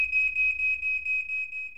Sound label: WHISTLY